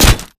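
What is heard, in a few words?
impact bullet light ballistic android; Titanfall 2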